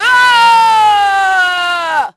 FALL, VOICE; Pulse Racer

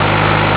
MID, Engine; Cars; LUIGI

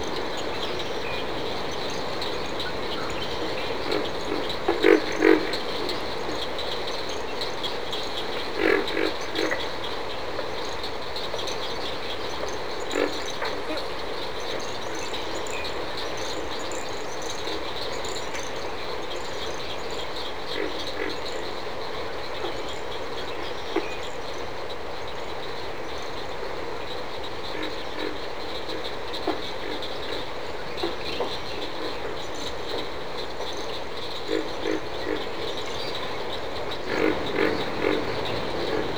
Amb Swamp; Bruce Lee Quest Of The Dragon; XBOX

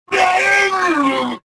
choke

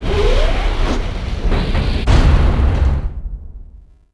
door close; tusken Arena; Star Wars Obi Wan; XBOX

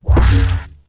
saber death

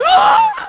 fall; Splashdown; XBOX

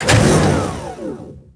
door sliding metal open; doors elevators; Star Wars Obi-Wan; XBOX

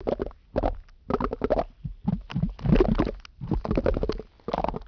tar; Ambient; MX vs ATV Unleashed

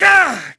damage; Pulse Racer

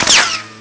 bullet miss; Pirates Of The Caribbean; DUEL

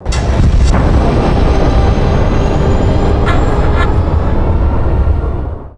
Ship takeoff; Droid Core Ship; Star Wars Clone Wars; XBOX

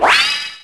Pairs Match; Finding Nemo; XBOX

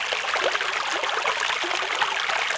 water lap; Star Wars Knights Of The Old Republic II; XBOX

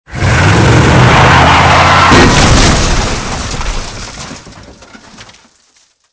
truck smash; Soldier Of Fortune II Double Helix; XBOX

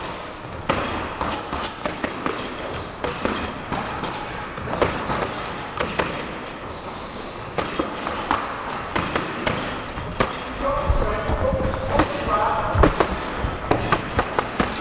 Box Ambi; Rocky; XBOX

